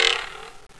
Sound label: twang